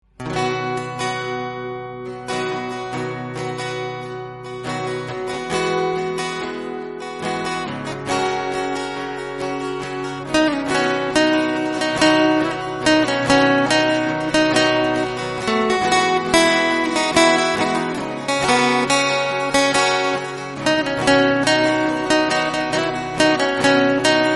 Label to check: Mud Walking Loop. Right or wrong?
Wrong